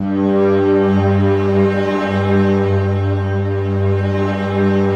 BELLPAD G1, Yamaha VP-1; Music Store; 90's Sample CDs

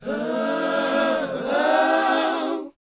BOYZ, R&B Dance; Spectrasonics Vocal Planet

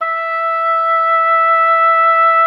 E5, OBOE; Xsample VOL-6

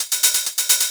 Hi-Hat, Afterhours EDM

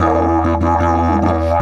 150, DIDGE RIFFS

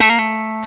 BLU TRIPLET, Guitar & Bass; 90 s Sample CDs; Quantum Leap - Guitar & Bass

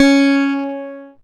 C#, PIANO; Big Fish Audio; Synclav FM

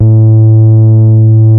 BASS STOP; Masterbits - Vintage Voltage; VV BASSES

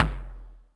Toms, Elektron MachineDrum SPS1 MKII

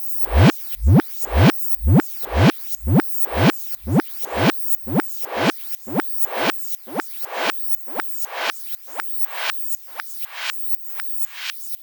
Beat, Drums, Eclectic Electronica

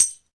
Tambo Hit; Zero-G Jungle Warfare 1, 2 & 3; 90 s Sample CDs

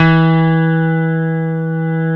CP70 A4, YAMA.CP70; East West; Global Sounds

